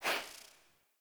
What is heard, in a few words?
UI Theme Gear Wheel Transition; Zephyr